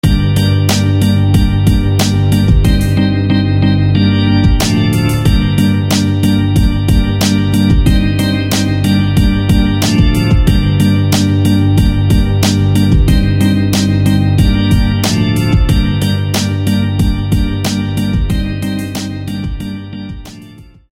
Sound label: Organ; Blackfire; SFB FX